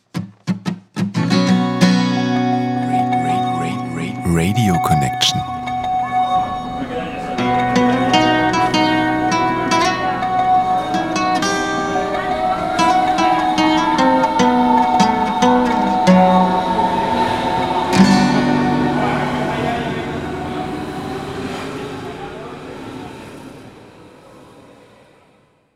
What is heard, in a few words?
radioconnection jingle